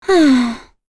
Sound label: Vox Sigh; Lewsia; kingsraid; voices heroes en